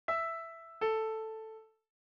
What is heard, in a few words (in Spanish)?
Intervalo Descendente